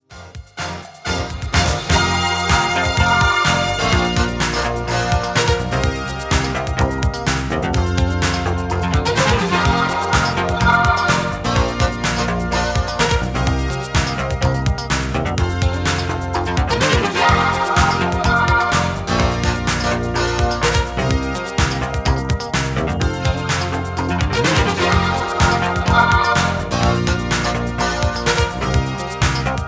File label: instrumental